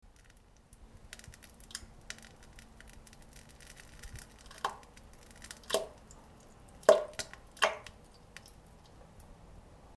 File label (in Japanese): 普通 うんこ むちむち ぽちゃぽちゃ 音